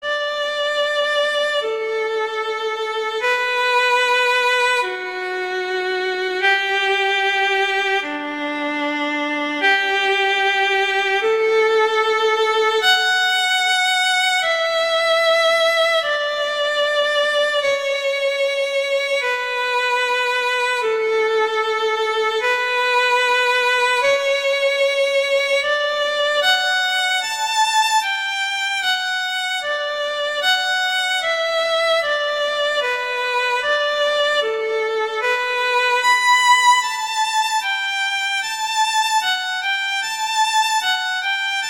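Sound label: Canon in D, Violin; Very Easy Collection; Pachelbel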